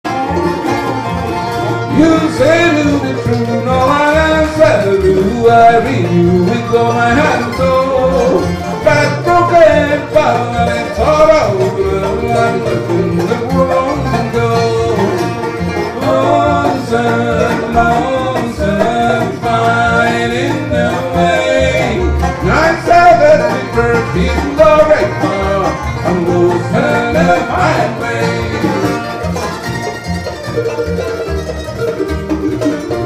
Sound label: Grass Quintet